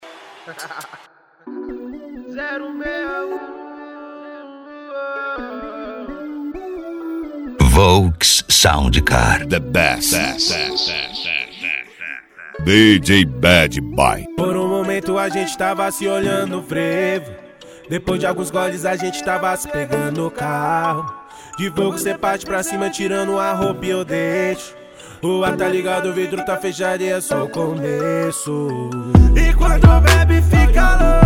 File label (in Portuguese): sound car hip hop nacional; cd volks sound car